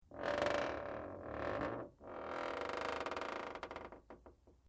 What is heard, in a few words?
METAL CREAK LIGHT; Materials